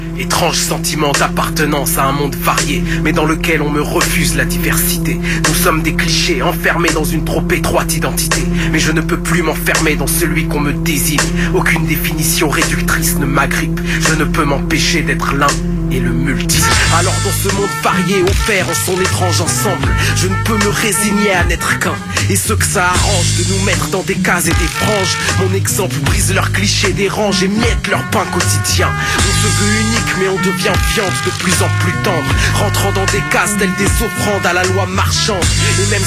Chanson rap

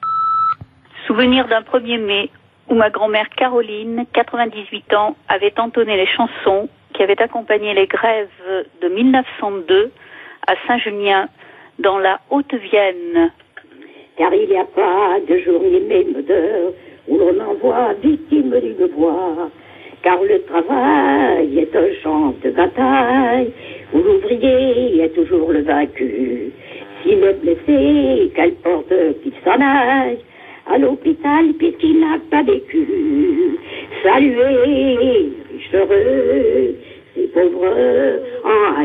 Chants 1er Mai repondeur

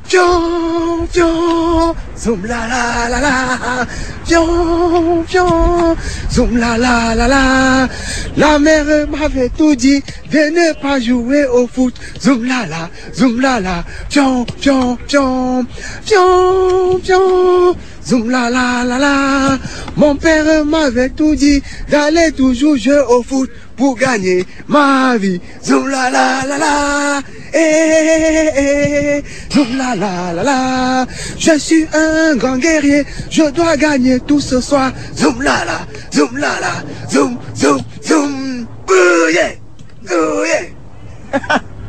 Chant supporters camerounais

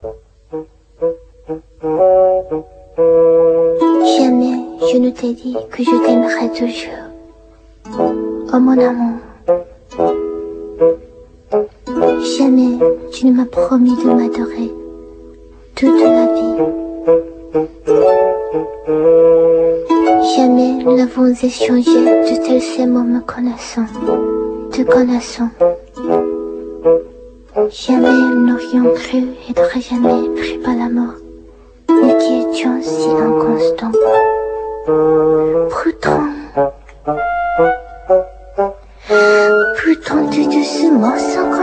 Chanson enfantine

